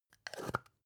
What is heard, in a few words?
spice jar scratch close soft; industrie wirtschaft; gastronomie